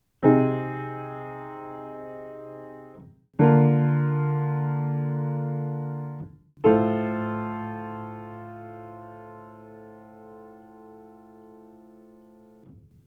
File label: midnight piano samples